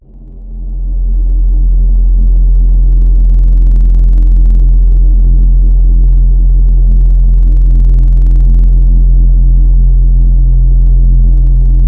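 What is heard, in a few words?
Pan Flute; Instruments; Pan Flue; Synthetic's Serum Collection; Echo Sound Works Core Tables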